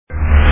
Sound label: Open Swoosh